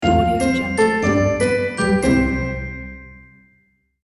uplifting catchy logo jingle

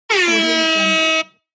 air horn

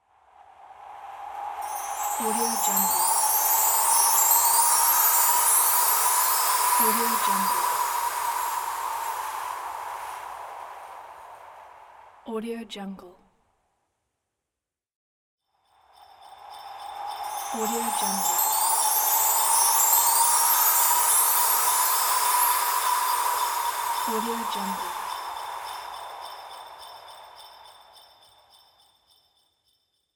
magic chimes wind

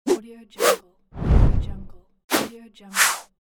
swooshes

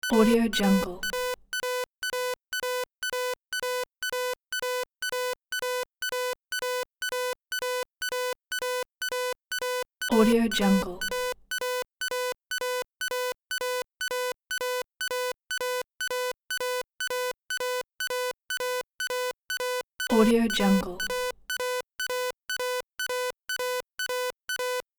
scroll data

